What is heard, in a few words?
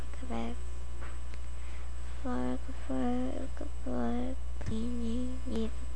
frog